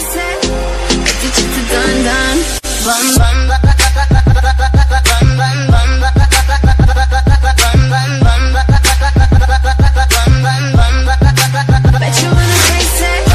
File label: english ringtone